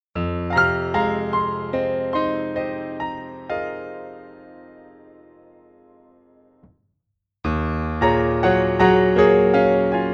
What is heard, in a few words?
ac piano dry